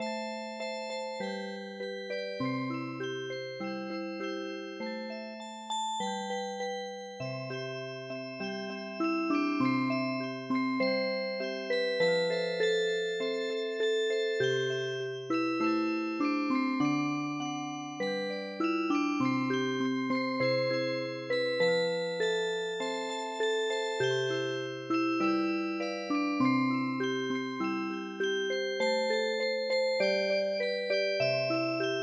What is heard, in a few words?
Music Box